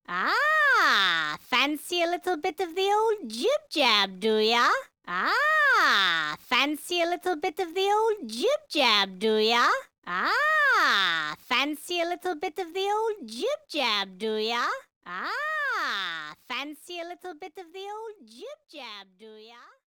swords

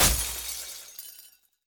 crystal break; Hollow Knight

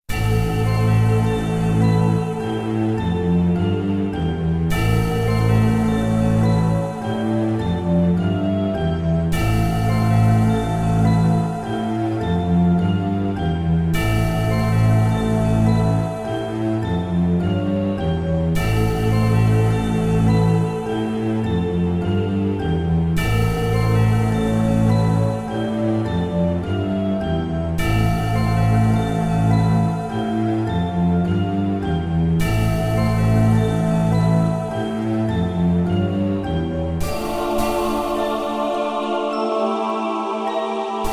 dungeon kazan; Hyperdimension Girl Quest

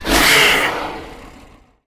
Raptor hurt; ice age scrats nutty adventure

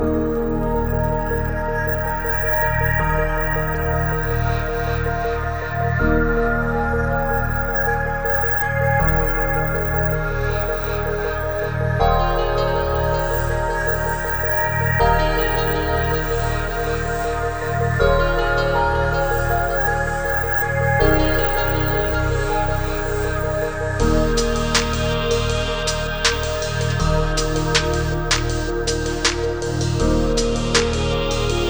Music menu; Digerati Indie Bundle; INK; Hackyzack

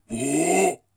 zombie guard club prepare

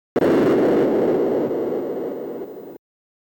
Blast

